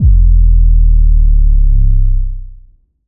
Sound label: phonk kicks oneshot - 808 e, Specially designed for phonk type beats, these nasty, layered 808 one; kick drum